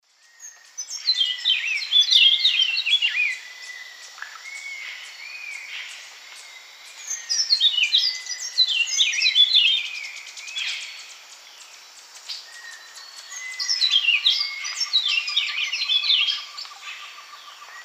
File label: Saltator maximus, alternate song; S. America; Eastern Lowlands